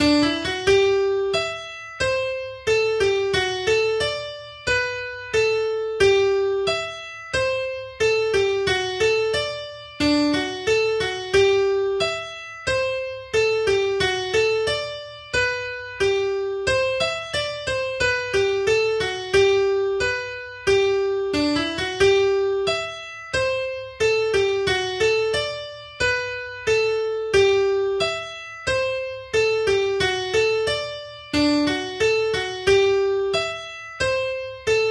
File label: little slow Hornpipe; Staffordshire Hornpipe